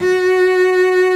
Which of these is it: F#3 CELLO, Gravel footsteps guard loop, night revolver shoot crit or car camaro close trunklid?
F#3 CELLO